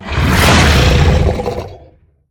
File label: Sniff; Evolve; Behemoth